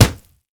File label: punch grit wet impact; Infernal Radiation